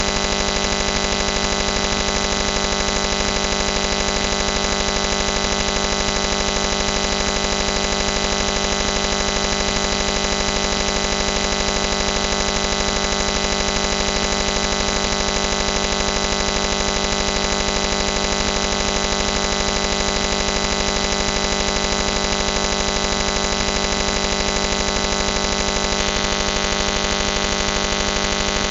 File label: LTEM